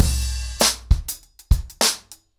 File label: Drumkit 100BPM, ACOUSTIC DRUMS